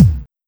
bass; osu

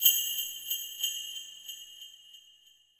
suzu; osu

